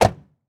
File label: mini van door close; prosoundfx